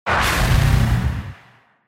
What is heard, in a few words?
Whoosh